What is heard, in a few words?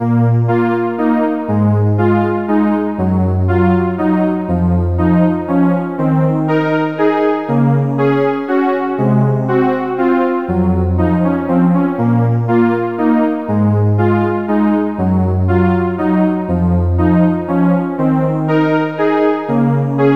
ethereal waltz, chiptunes